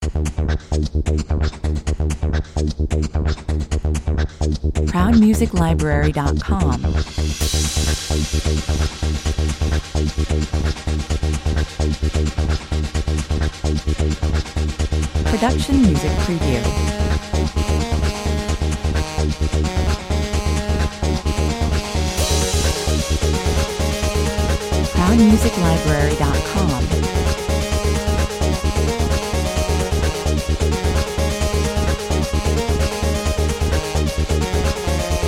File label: no drums 130 bpm